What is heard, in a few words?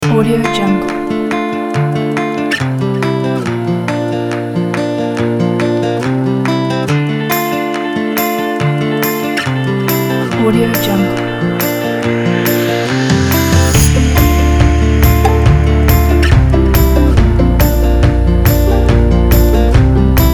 melancholic acoustic vibe